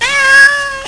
cat

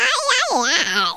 oooww; Swedish

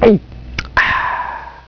gulp; Realmz 2.0.4

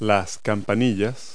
BELLS; Multi-Lingual Talking Picture Dictionary

